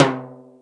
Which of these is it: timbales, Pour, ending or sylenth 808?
timbales